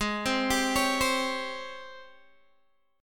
G sharp Major 11th G sharp, Guitar DADGAD; 18,15,17,18,15,x

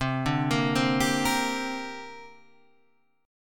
C 7th Suspended 2nd

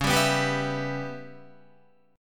Db Minor 7th Flat 5th Db down Guitar; strummed

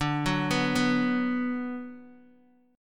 D 6th D down Guitar Open D, chord

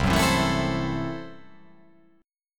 C 6th Flat 5th, C, down

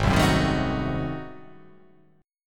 G sharp 7th Flat 9th G sharp down, chord

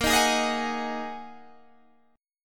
Bb 7th Suspended 4th Sharp 5th Bb Guitar DADGAD; 20,18,18,20,21,18

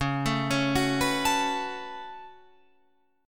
Db 7th Suspended 4th Sharp 5th Db Guitar Open F; arpeggiated

